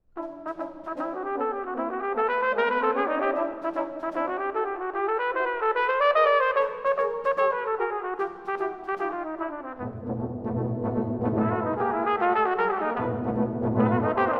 Trumpet Brass Band; Concerto No. 3 for Trumpet Brass Band

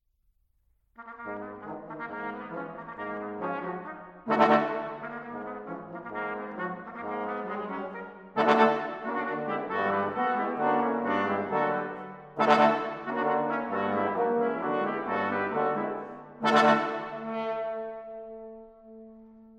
Quintet Presto brillante, Brass

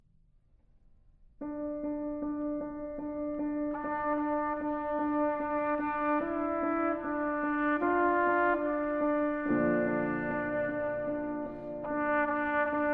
Trumpet Songs

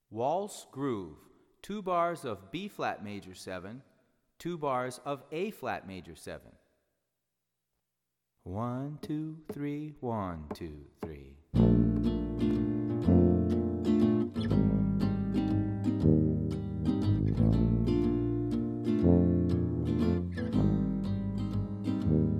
Waltz Groove, Bbmaj7 Bbmaj7 Abmaj7 Abmaj7

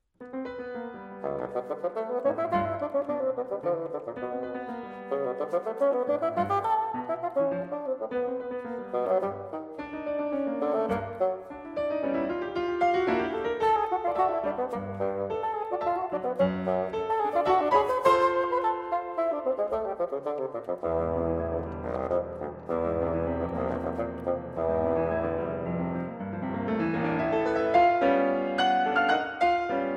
Sonata For Bassoon & Piano, Allegro moderato

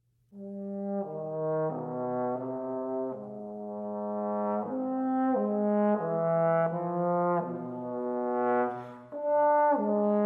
Sarabande in C Minor

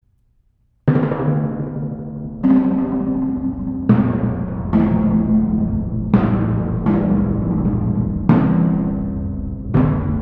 Double Timpani Concerto, Impetuous

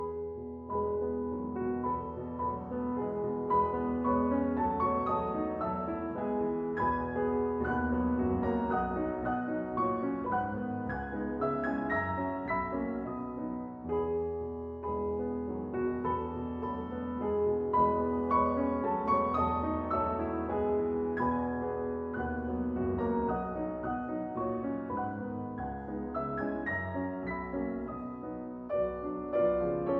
Waltzes, For Piano, four Hands, E Major; Brahms

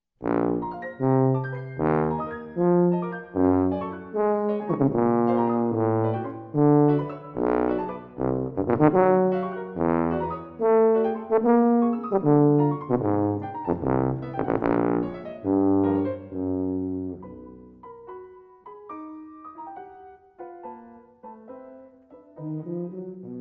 Sonata For Tuba & Piano, Allegro Pesante

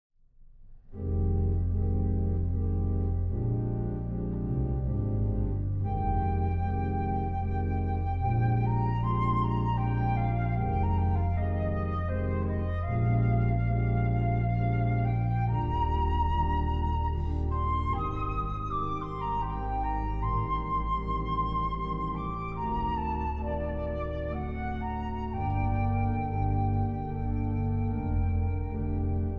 Elegy, Flute and Organ

